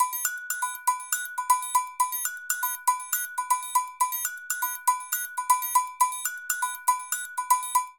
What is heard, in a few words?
Samba Triangle Agogo 120bpm, Latin Percussion; Ableton; Factory Packs